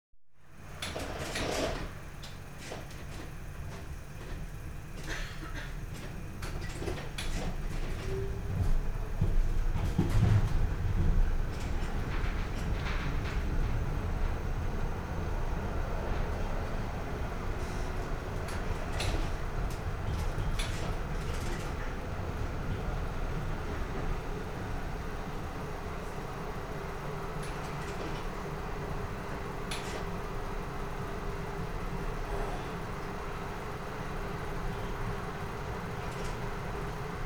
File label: Switzerland, Tram Journey; Basle; European Soundscapes; BBC Sound Effects Library